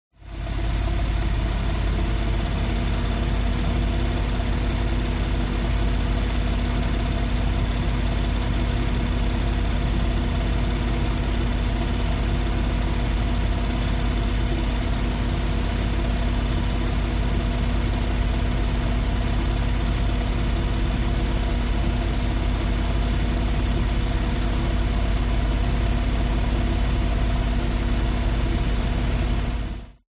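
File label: SPORTS CAR Exterior Engine Idle Ventilation; TRANSPORTATION; smartsound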